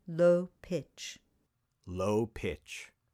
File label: Low Pitch